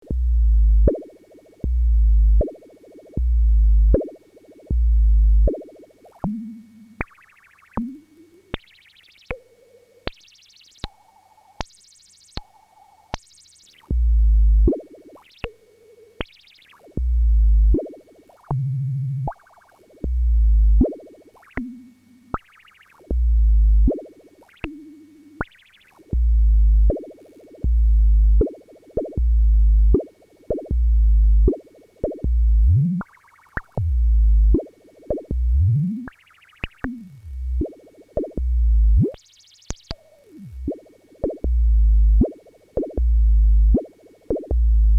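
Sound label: click reso slow square fast triangle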